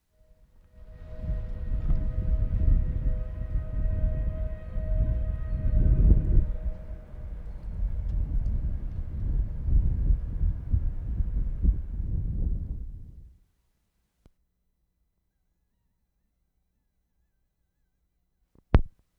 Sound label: BREWERY WHISTLE, Skruv, Sweden; Skruv, Sweden Feb. 21/75; EUROPE COLLECTION